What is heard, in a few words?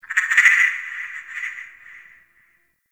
Forest Distant Frog Call; Warframe; Grineer